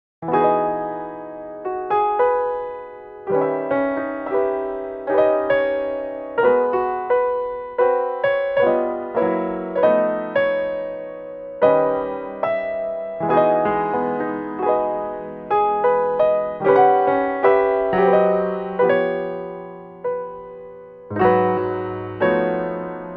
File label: solo piano